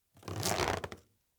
seam rips short and long bursts

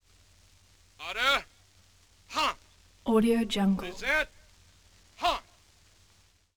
troops train

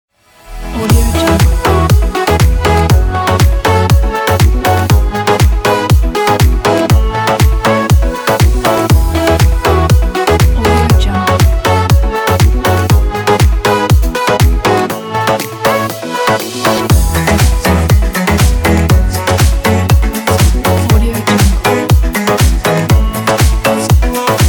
cool pop

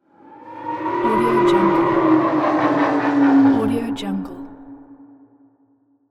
ghostly howl

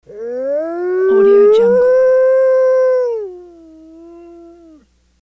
single wolf howl; hollywoodedge

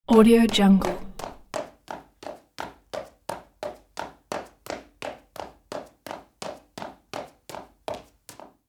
female in flat shoes fast steps on marble stairs; sound ideas

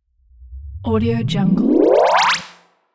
swoosh transmission

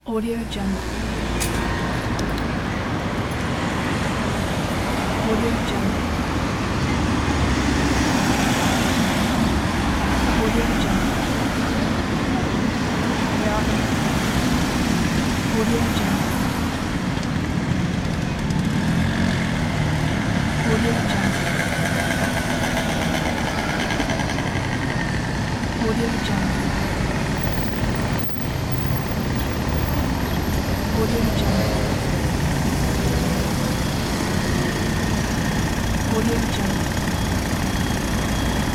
crossroads in the city

